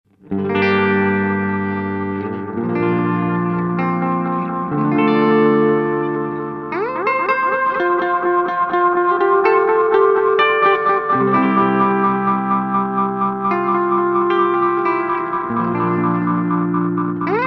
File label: Way Huge Aqua Puss Delay